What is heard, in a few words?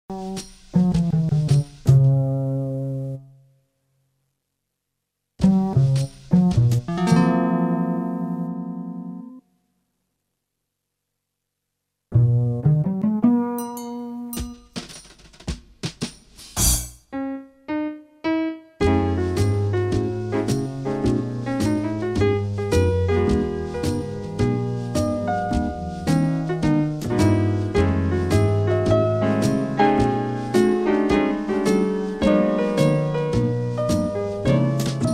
Aperojazz